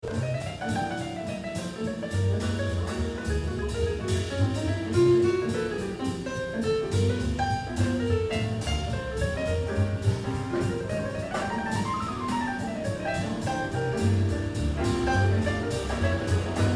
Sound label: Jazz F Train